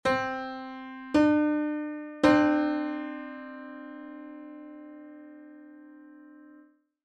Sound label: Piano 15edo